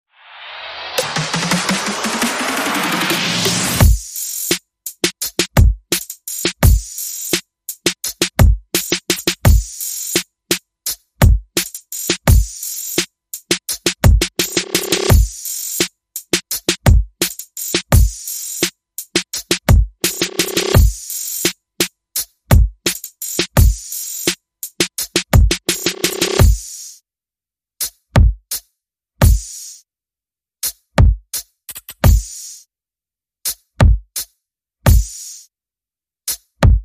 Perc and FX Only

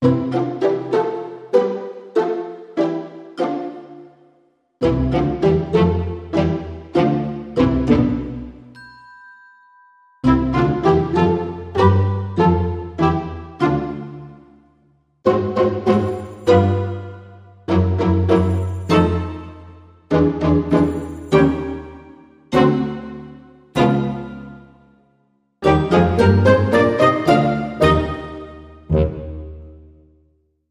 Modern Percussion

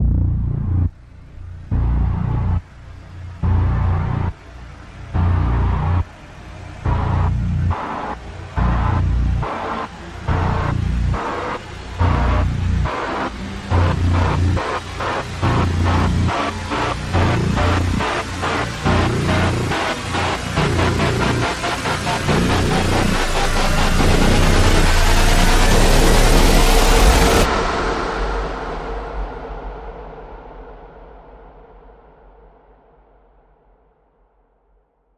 Rise Long On and Off

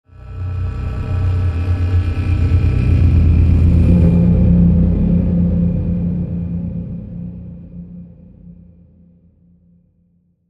Stellar Navigation Transition

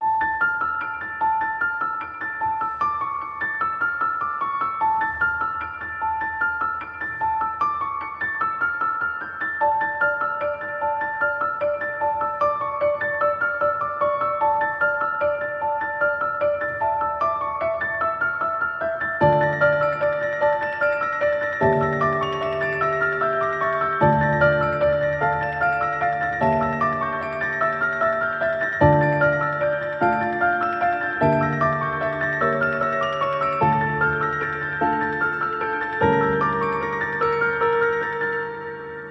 Piano Only

